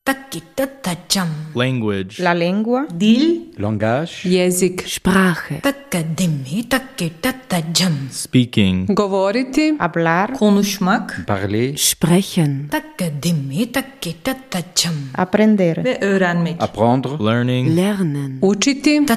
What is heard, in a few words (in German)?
jingle allgemein